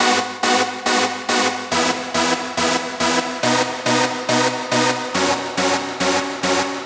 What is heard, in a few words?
140BPM G#; Vengeance - Essential Clubsounds Vol.4